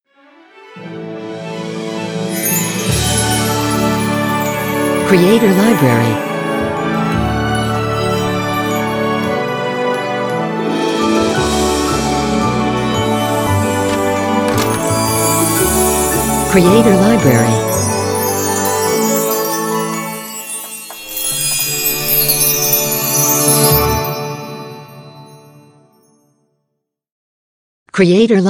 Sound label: New Year Ident